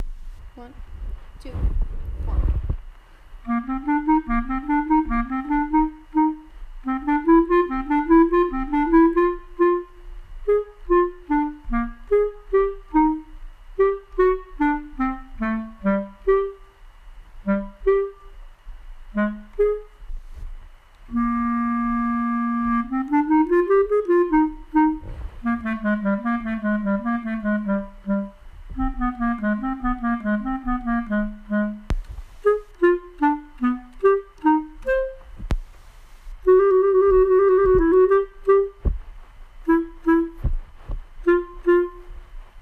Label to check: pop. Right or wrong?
Wrong